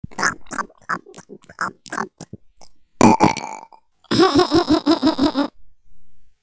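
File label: eat burp laugh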